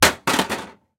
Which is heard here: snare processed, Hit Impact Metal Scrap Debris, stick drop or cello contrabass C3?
Hit Impact Metal Scrap Debris